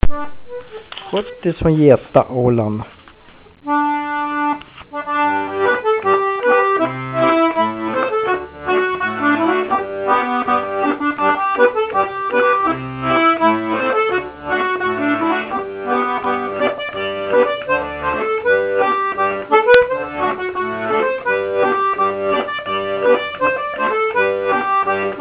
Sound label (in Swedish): Schottis dur; Schottis fran Geta; Arkivinspelningar